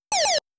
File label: Target Get; Mappy